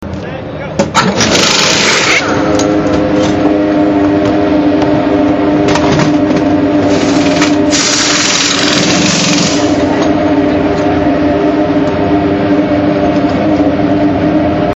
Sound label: car pit tire practice